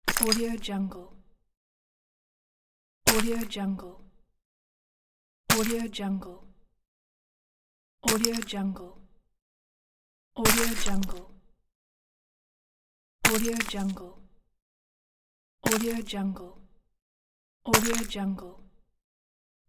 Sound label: ice impact small pack; inspectorj